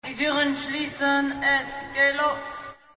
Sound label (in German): Jingels